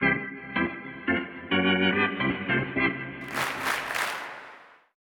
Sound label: organ